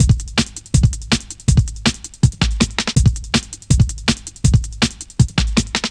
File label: 162, breaks, Loops; M8